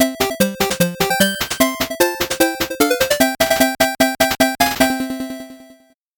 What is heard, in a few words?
8bit jingle normal